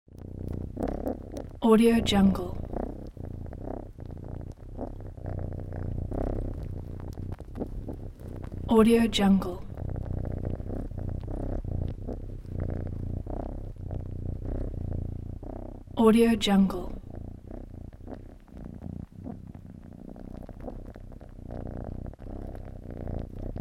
cat purring

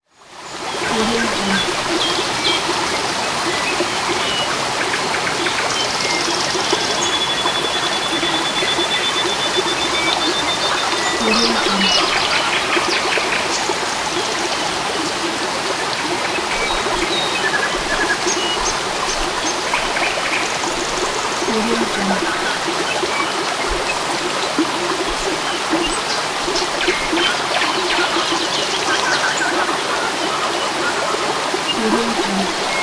birds by the stream; chicasound

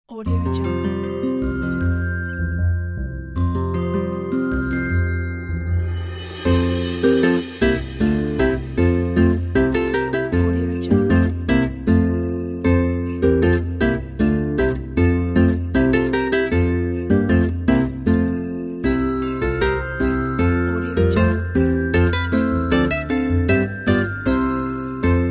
acoustic deck the halls

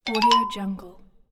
xylophone

